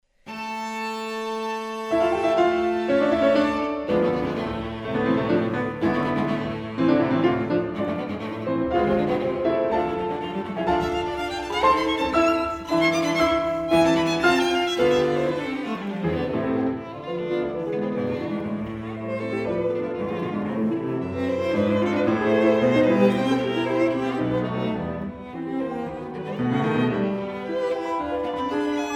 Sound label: piano trio